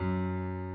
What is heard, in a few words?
key; keySounds